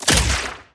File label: stun; chargedbaby